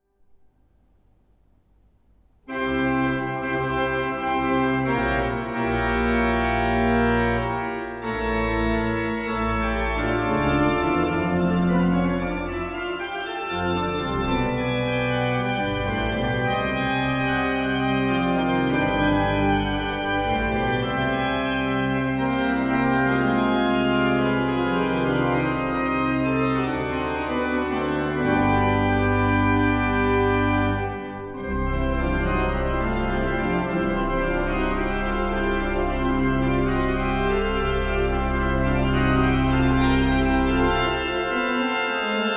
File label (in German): praeludium C Dur, orgeln; buehler franz; thannhausen